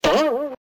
Menu sfx unused

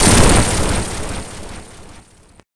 Ledge Break; Sonic Mania

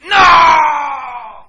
death; Marco

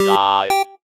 retro

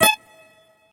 Ambient; Deus Ex

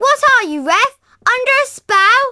Crowd

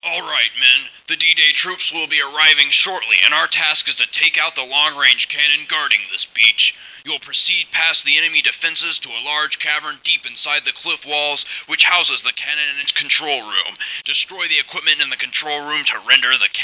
motive; Unreal tournament

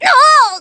Damage Fly; SSB Brawl; Ness

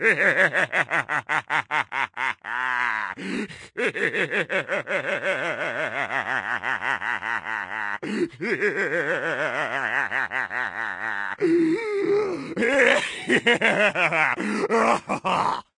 plankton laugh; Supersponge

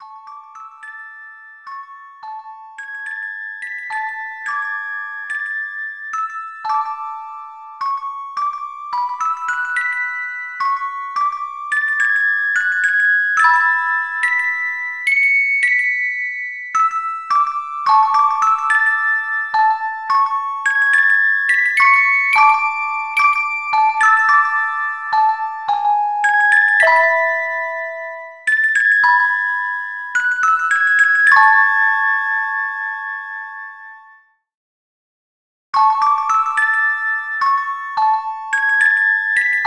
game over

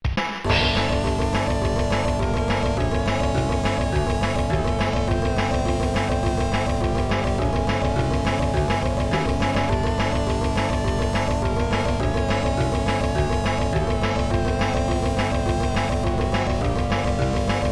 unused music

